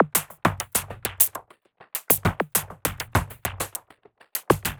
perc 100, Percussive Loops, 100 bpm; chachi

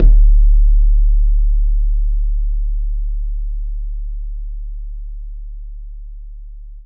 BAYAN, Drums Percussion; E-MU Producer Series Vol. 4 – Denny Jaeger Private

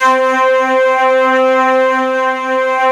ST-ORG, STRINGORGAN, Yamaha VP-1; Music Store; 90's Sample CDs

